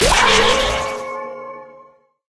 bumper car hit ghost; tf

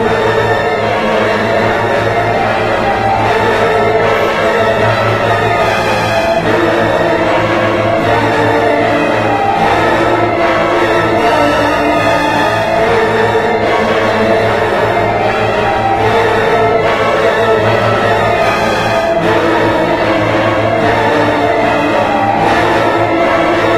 enzymicide, music; Left 4 Dead 2; Source Engine; video games

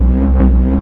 beam platform loop; video games; ambient; machines; source engine; portal2

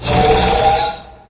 ramp raise

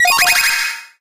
killsound electro

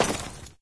player gravel; Source Engine; dod

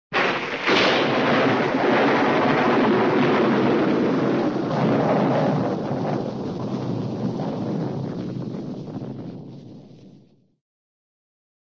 thunder clap and rumble, weather; cartoon

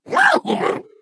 ballbot jump short

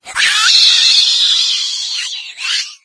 death, infected female; Left 4 Dead 2; Source Engine

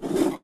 taunt bongo slide; video games; player; runners rhythm; source engine; tf

